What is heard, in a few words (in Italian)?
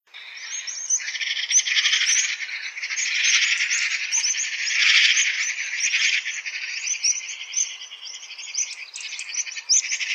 Gazza